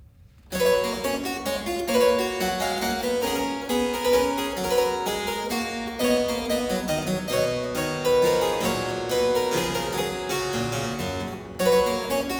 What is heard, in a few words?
Menuet in G major, Keyboard Works; J.S. Bach; Johann Sebastian Bach; Inventionen & Sinfonias